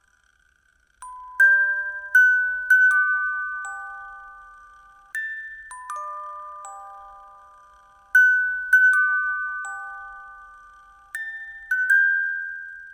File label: music box